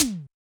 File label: E Tom 5, Yamaha DJX-2B